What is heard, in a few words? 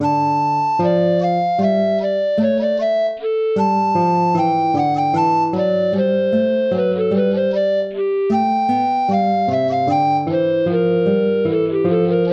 air hornpipe; haendel georg friedrich